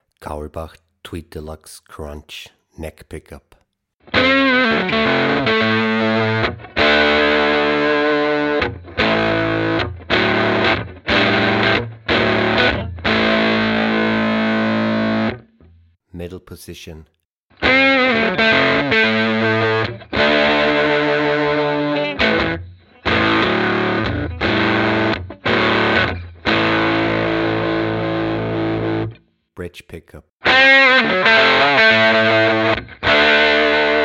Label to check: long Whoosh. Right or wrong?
Wrong